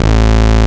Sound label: A-BASS, AN BASS; AKAI S-Series CD-ROM Sound Library VOL-7